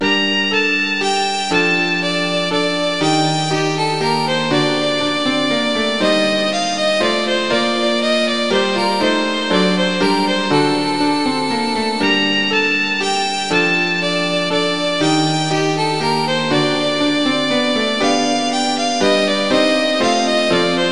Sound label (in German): menuett g moll; bach